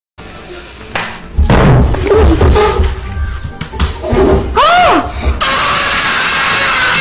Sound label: the SCREAMING KID; asmr